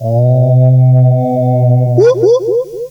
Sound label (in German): Jingels